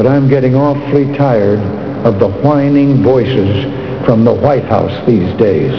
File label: whine